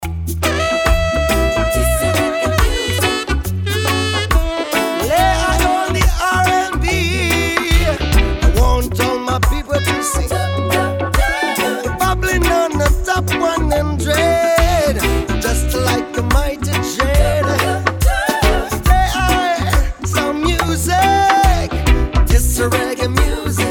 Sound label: Roots Rock Reggae